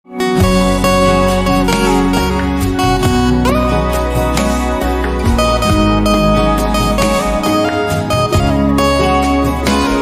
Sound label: Guitar